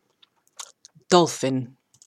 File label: Dolphin